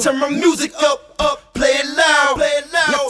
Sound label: turn it up acapella